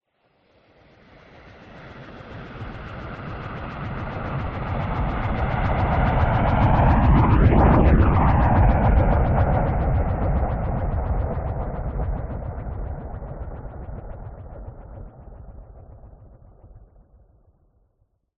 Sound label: science fiction spacecraft large loud spinning pass by; zapsplat